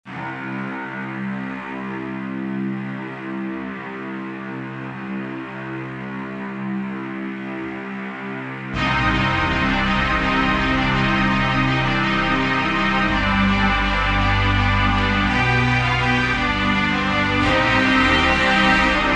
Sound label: instrumental